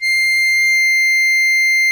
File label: HARMONICA C6; Sonic Reality; American Heartland